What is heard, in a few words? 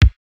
kick, house